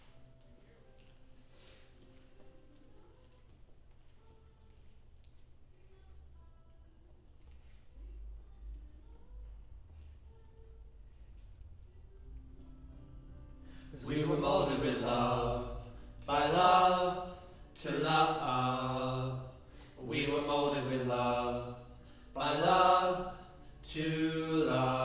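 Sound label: Choir Harmony Low Mid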